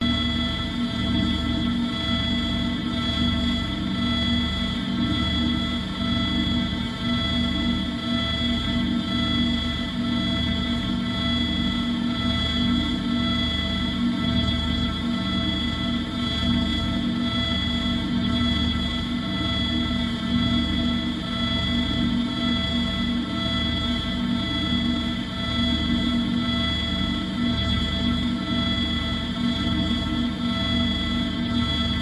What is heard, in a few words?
space drone; Audio Mangler